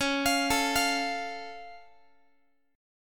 C sharp Augmented down Guitar, chord; x,21,20,19,21,x; arpeggiated; JGuitar

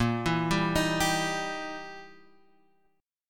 E Minor Major 7th Flat 5th A sharp

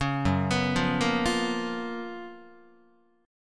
Gb Major 11th Guitar; GbM11 arpeggiated

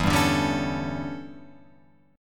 E Minor 13th guitar chord, Open F; Em13 strummed; JGuitar

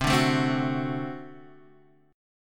D 7th, C

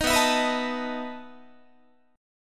B 11th Guitar; strummed; 18,19,19,21,19,20

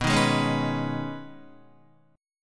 B 6th Add 9th B Guitar, chord; B6add9; strummed

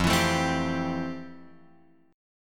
F Major 9th; FM9 Chord {1 X 2 2 1 3} strummed

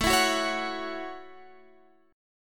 A 6th, Guitar, Open F; A6 strummed; x,16,16,13,16,13

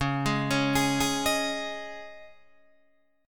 Db Suspended 2nd Guitar Open D

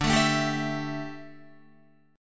D Suspended 2nd Suspended 4th D down Guitar; strummed

